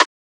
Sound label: Perc